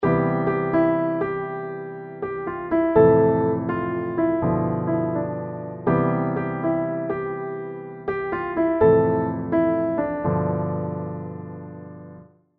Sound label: Piano B